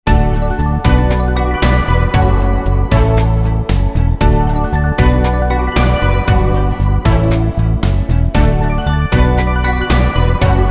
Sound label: bonus game music; arctic blast